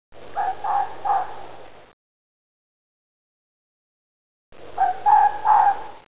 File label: fox mating call